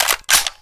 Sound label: masada bolt pull; garrysmod